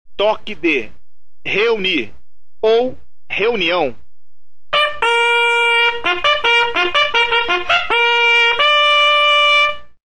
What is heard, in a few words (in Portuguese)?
Reunir ou reuniao, toques de corneta